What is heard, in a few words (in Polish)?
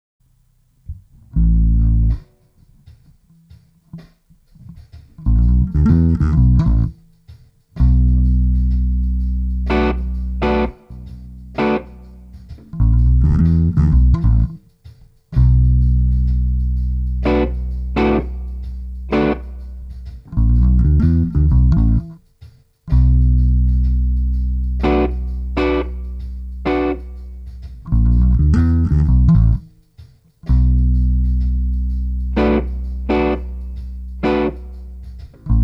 bas, loops